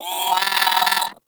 Hyena Micro Vocal Death; Warframe

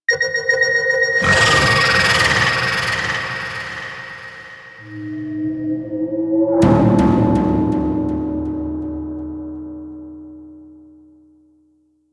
Harvester Warning; Corpus

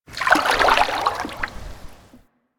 Water Lapping Gentle Small; Ambience; Warframe

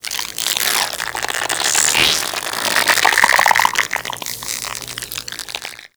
shock swarm projectile random; Warframe; Infested; Shock Swarm Rifle

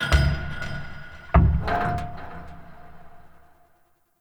Castle Misc Metal; Ambience; Midrath Island

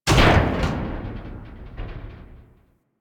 Ship Hull Impact Small; Hit Effects; Warframe; Railjack Hull Hit Effects

